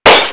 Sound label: bricks falling down; Pinocchio 1995 SNES; gamesoundopedia